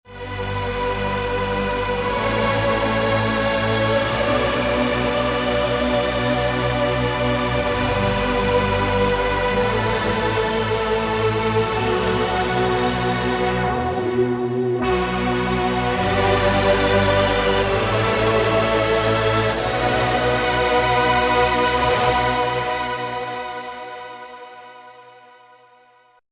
NuNRG Pad, Roland V-Synth; Factory Patches

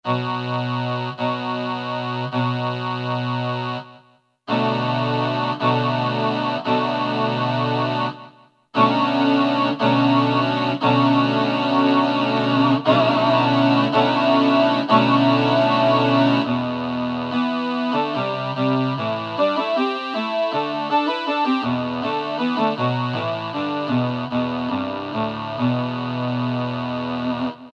Korg microKORG Male Ahhh 5th; Factory Voices

